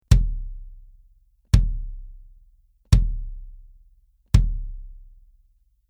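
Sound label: BASS Hard Plastic, bass drum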